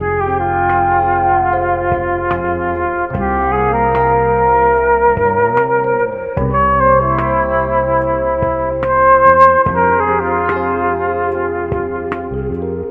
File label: flute, Loops